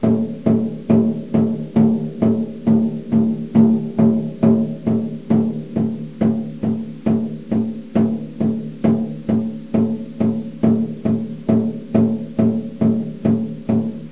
big drum; Loop Samples; VoicesofNativeAmerica

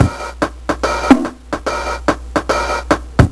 Map Beat; Grunt

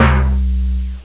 timp C2; Alone in the Dark Demo

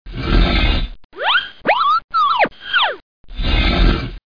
slide and jump; DR BRAIN; BUGS

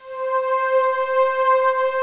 Warm Pad C5; Star Trek JR Audio

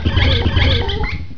power

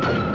present appear; graveyard

